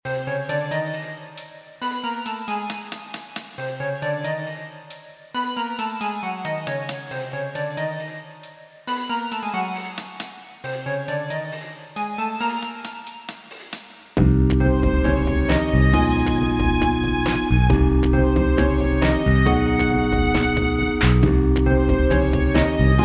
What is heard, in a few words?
Dungeon; tinkertown windows gamerip 2020